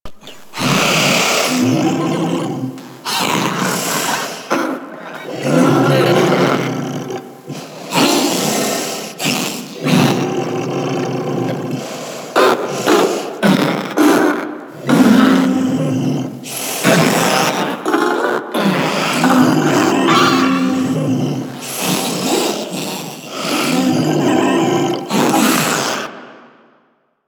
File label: Proto Infested Ancient Breathe Loop, Enemies Vocals; Warframe